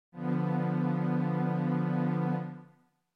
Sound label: B Diminished